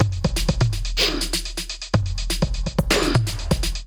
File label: Street Kit groove 124bpm, Beat Tools; MIDI Clips; Ableton Factory Packs